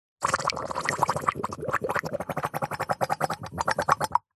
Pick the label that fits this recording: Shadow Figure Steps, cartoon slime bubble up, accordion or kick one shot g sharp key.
cartoon slime bubble up